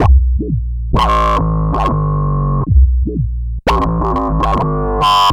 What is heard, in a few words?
Future Bass; Drum & Bass; Fluid Dynamics